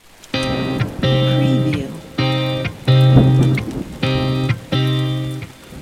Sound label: Soft effect, electric guitar 2/4